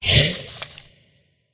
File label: riding bug wound